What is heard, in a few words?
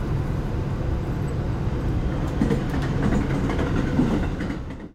train